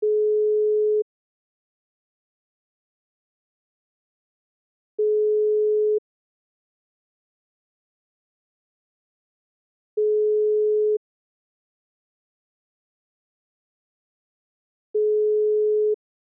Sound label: ringback